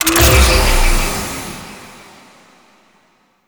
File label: Distraction Drones Appear; Archwing